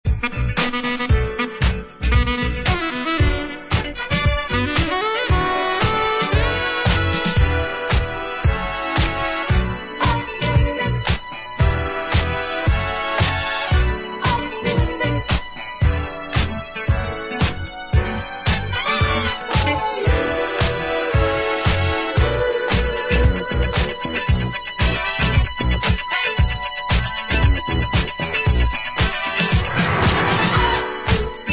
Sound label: Instrumental